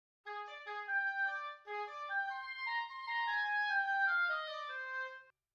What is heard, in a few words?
oboe midi test